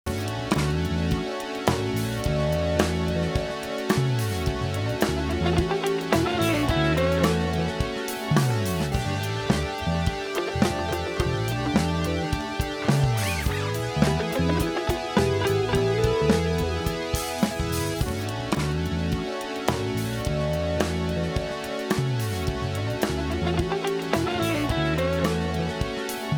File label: COMP R22 2 dB GR Soft Knee